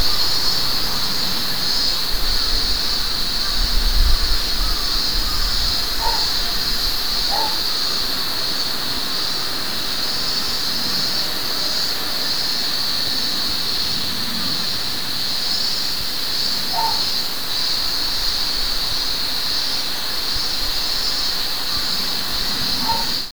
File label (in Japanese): mushi